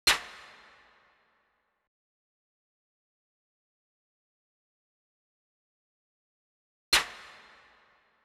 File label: Drum Loop - 140 BPM Percussion, Drill; Loop Stems; Cymatics; PARADOX II - Drum Loop Collection; Bow